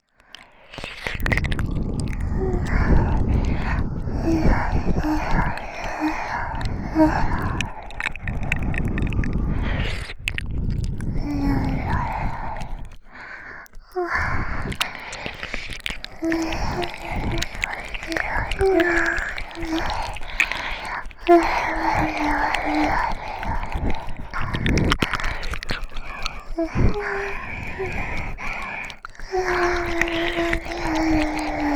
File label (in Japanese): 吐息＆ふぅふぅ攻めとれろれろ舐め; 両耳Ver